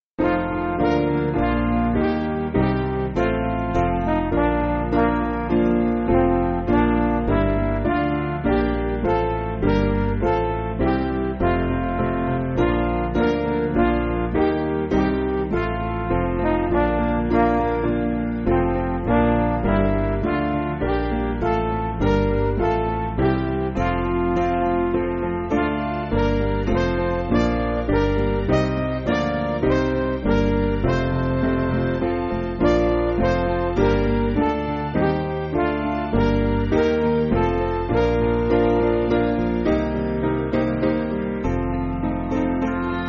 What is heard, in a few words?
Jehovah Let Me, Dir Dir Jehova, Midi